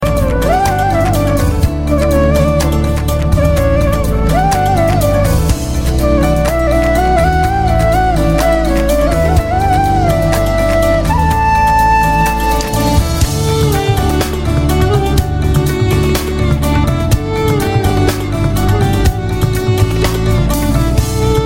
flute and violin

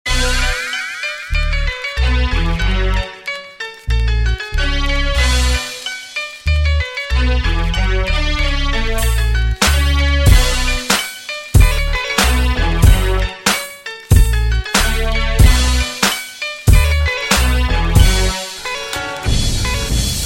chillin hip hop

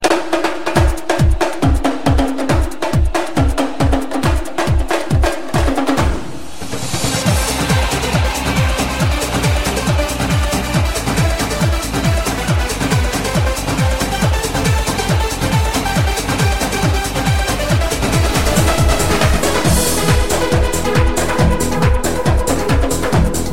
samba drums mix; длительность 0:27

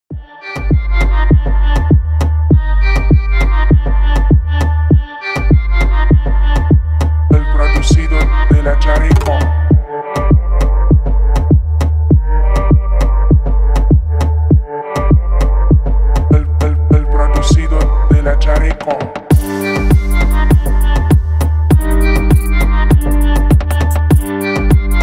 ambiente

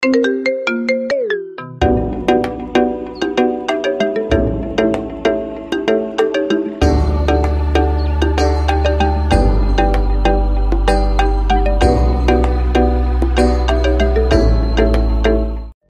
marimba remix

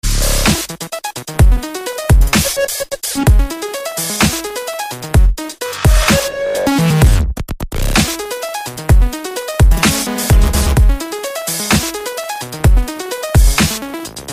electro remix

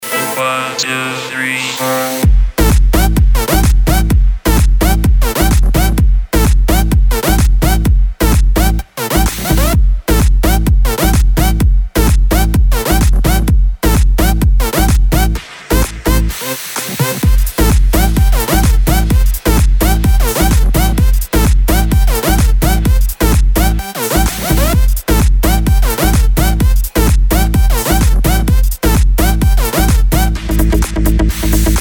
sax remix

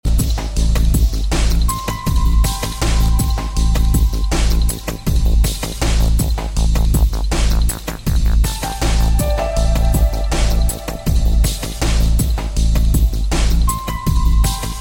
nice soft music

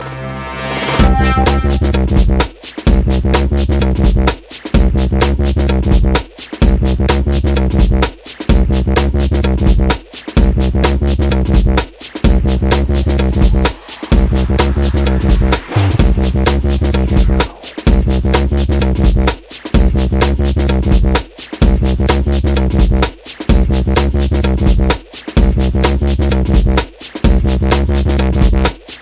electro bass

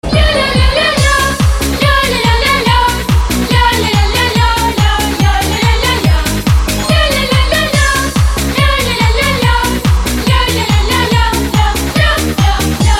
lya lya lya lya lya lya

